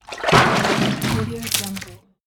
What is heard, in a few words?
fish in water